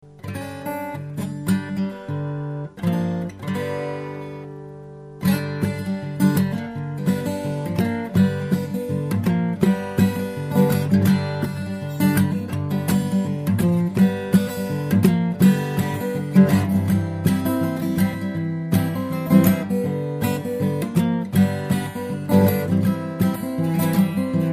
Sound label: bit o country blues